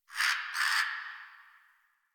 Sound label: Tree Critters Angry; Warframe; Duviri